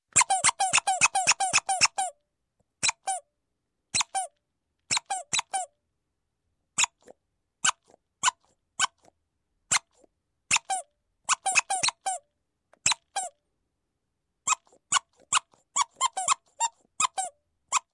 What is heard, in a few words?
Toy Squeeze Toy, Squeaking; Sound Ideas 2000 Series